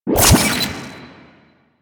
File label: Heist Keycard Pickup; UI; Pickups; Warframe